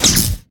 Weapon Magic Attack; Inotia 3

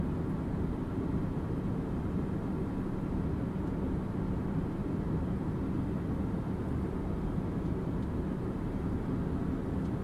A VENT LOOP; Dungeon Defenders Eternity; CRAZYSCIFI AUDIO

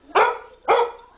bark; super trench attack

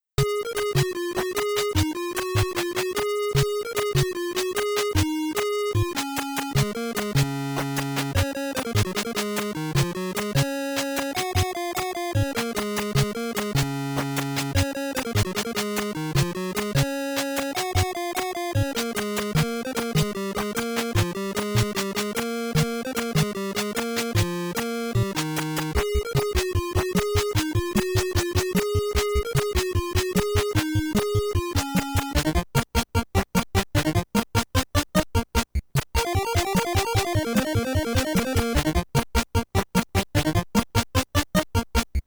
London Bridge is Falling Down, NES; lemmings

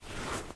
MANNEQUIN BLOW SOUND; don t be afraid the first toy

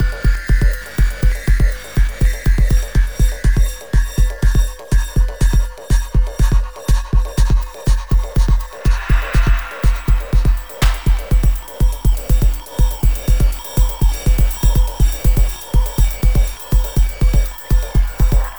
pulse

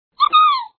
Sound Success; meeblings

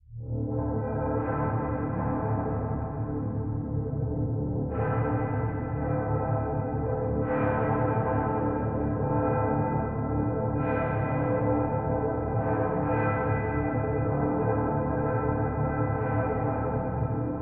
Ship Water Beat; Far Cry

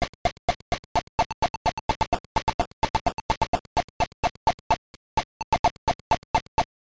Jingle; Genjin Cottsu

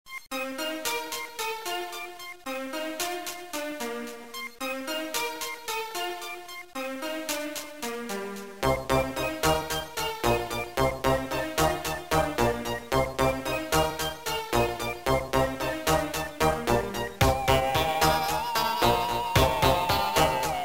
Disco; Kim Possible III Team Possible; Disco Station 9 Level 2

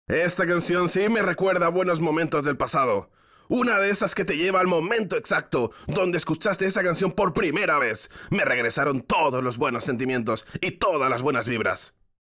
Music Vehicle Radio Events

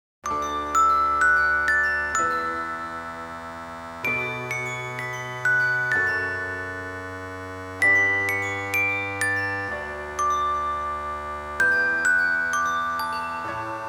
(Toy piano Version); Mother 1 and 2

